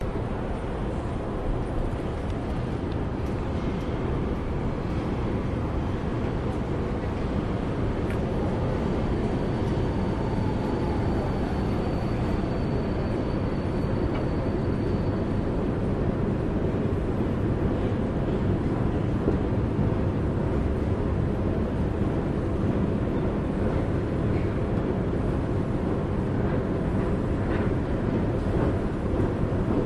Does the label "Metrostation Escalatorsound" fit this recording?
Yes